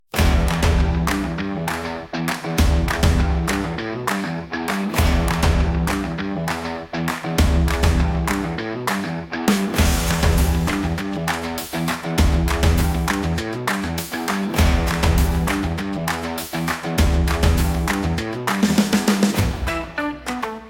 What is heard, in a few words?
Stomp